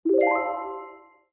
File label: Cake Upgrade Completed; Cookie Jam